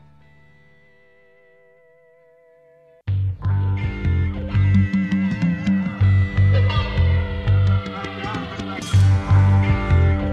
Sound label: Instrumental